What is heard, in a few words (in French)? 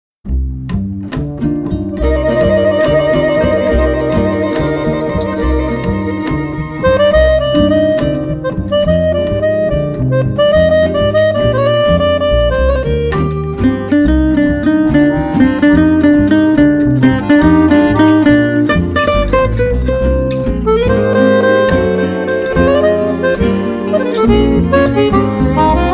accordeon